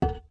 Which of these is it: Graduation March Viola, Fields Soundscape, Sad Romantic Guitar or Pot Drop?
Pot Drop